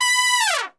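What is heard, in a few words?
B 3 TRP SHF, Trumpet; Best Service; Brass Super Section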